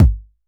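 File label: KICK TIGHT; Crypton - DrumBase5000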